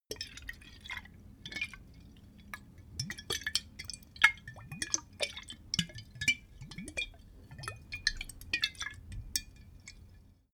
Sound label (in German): bottle vodka movement, gastronomie